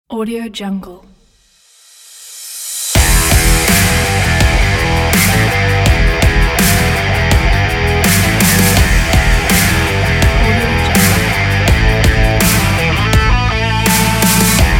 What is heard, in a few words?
rock logo